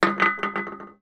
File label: stone down on metal drain cover; foley